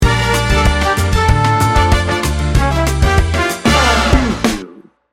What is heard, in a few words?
miami holiday sting bumper